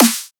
Dubstep Snare; Ghosthack; Dubstep Sound Arsenal

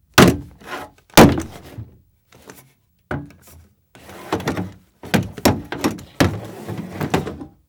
Metal Hits And Bumps; Fairlight Sound Lib

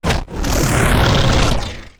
wooshes transform sweetener

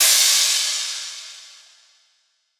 Crash; Hats and Cymbals

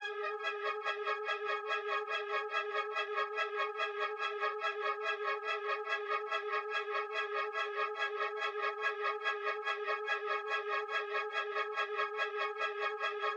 space arp, 143 BPM C# Min; Cymatics; Balaclava

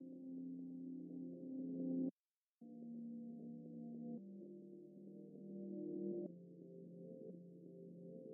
115 BPM F Min Reverse Keys; Loop Stems; Cymatics; Lovin U

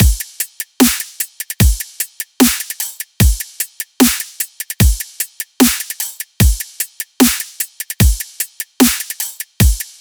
Dubstep Full Drum Loop - 150 BPM; Drums; Loops - Full

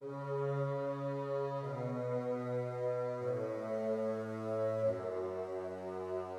Nostalgic Melody Loop - 150 BPM C# Min Pad; Loop Stems; Cymatics; 2020 Melody Collection

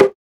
Perc; Cymatics; Drum One Shots; Percussion

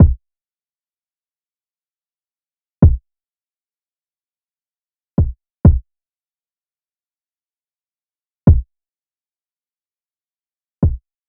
Drum Loop - 85 BPM Kick, Lofi; Loop Stems; Cymatics; GHOST (Beta Pack); Breach Drum Loop

